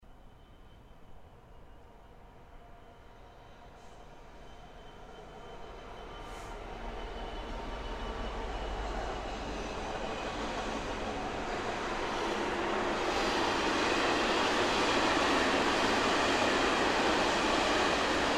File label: Lonesome Freight